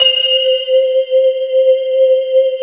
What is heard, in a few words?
HOLY BELL C5; Q Up Arts; Dream Experience; SYNTH